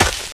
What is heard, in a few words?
grass, footsteps, infected, walk